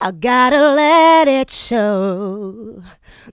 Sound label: Female Vocals; Houseworx